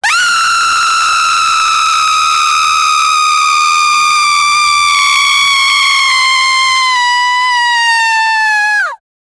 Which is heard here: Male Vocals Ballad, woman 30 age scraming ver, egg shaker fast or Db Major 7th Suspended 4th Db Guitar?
woman 30 age scraming ver